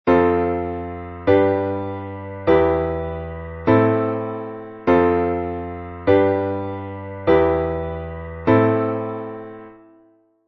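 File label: chord progression; oudo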